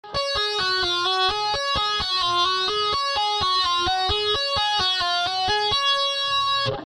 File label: tapping